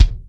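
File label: bass drum ambient; 300 Drum Machines; Yamaha Motif ES-Series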